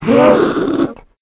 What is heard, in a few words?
zombie poison alert